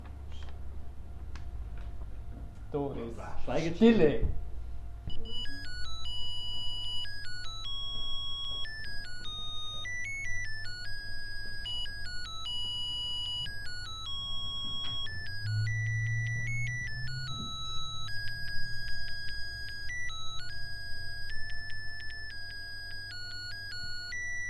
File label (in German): Jingle Bells Quietsche; Alternativer Vorspann